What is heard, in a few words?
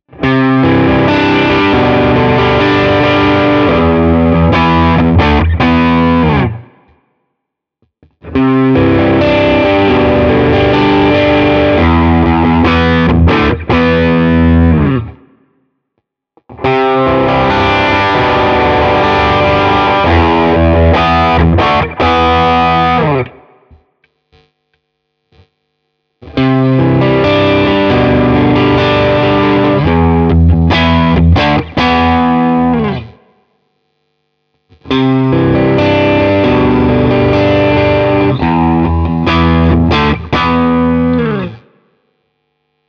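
Hagström mid gain Riff B all positions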